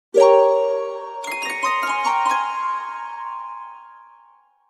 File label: laugh; League of Legends; Sona